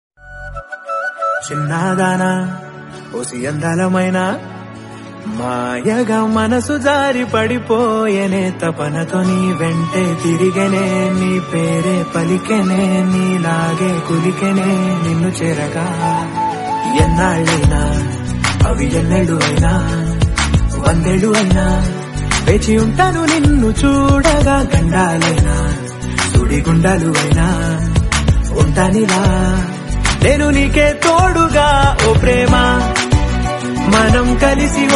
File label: Love Telugu Ringtones